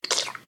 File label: slime nodes place; Mineclonia; mcl core